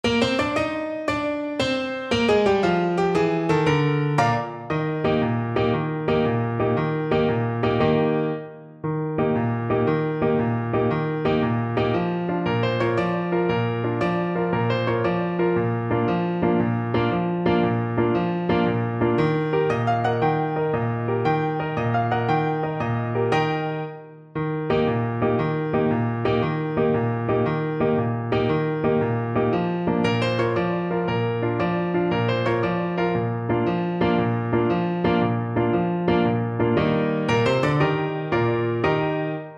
Tenor Saxophone

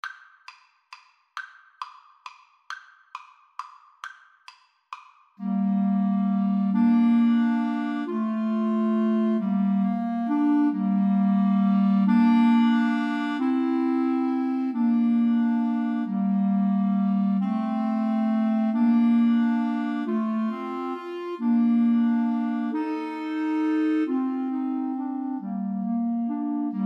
Clarinet Trio

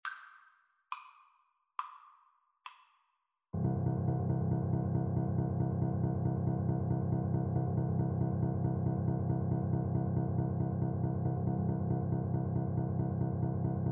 also sprach zarathustra Recorder

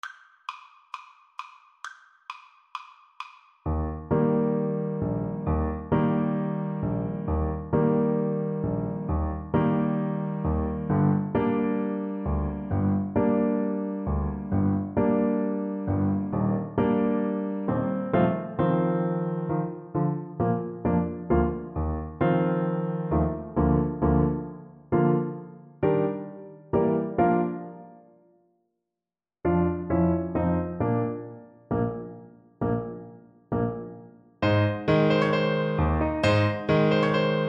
Tenor Horn

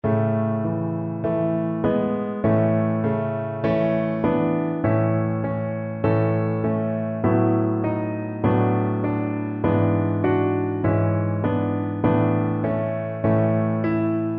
ave, Double Bass

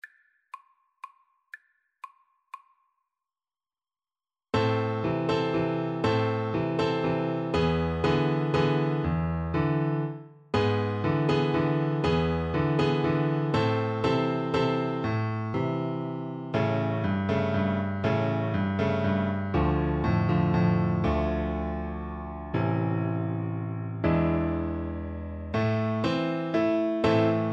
La Partida, Venezuela Waltz for Alto Recorder

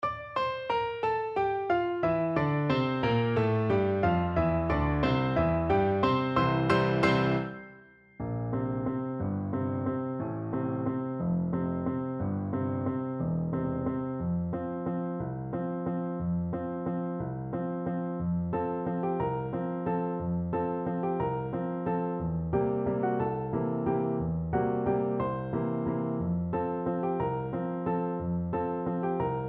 lake wal, piano duet

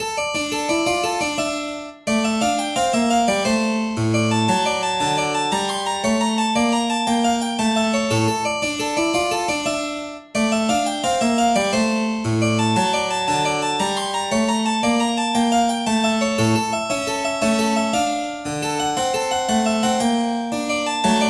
harpsichord

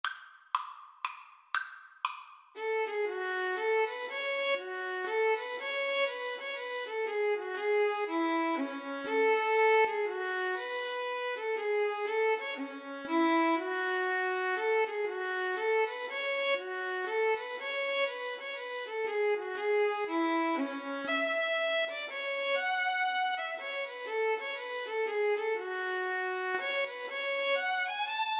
Violin Viola Duet

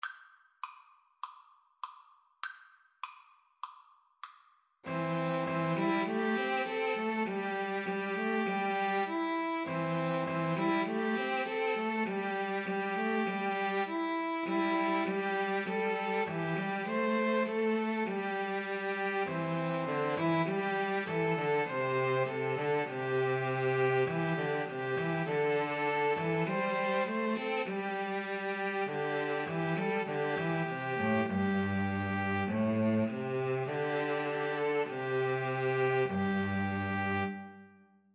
jasmine flower, String trio

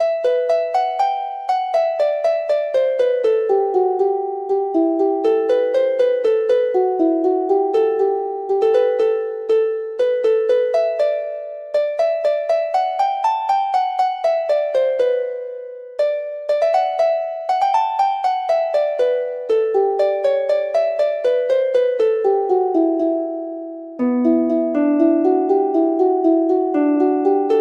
harp

